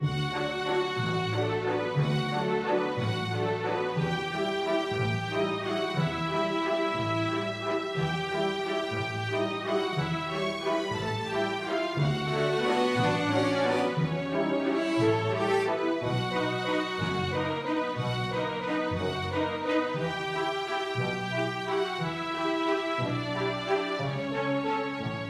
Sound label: Waltz